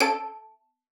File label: A4, VIOLIN SPICC; Xsample VOL-5